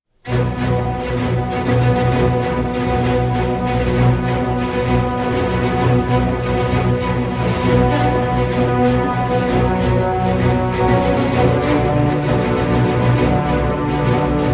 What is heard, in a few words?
Orchestral